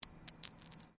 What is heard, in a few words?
Coin Penny Single Drop Stone